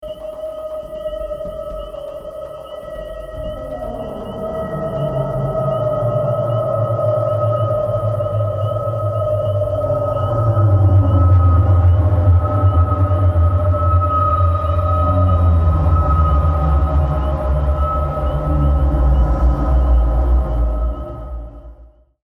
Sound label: Meditation Descent